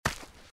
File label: giant rat foot; npc